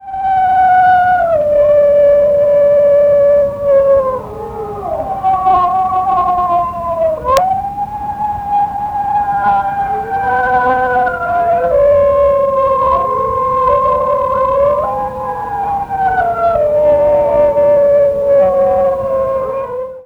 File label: dog song